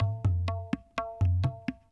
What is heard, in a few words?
PERC LOOP ETHNO 125